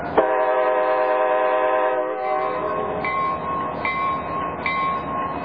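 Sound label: dull long horn and bell ringing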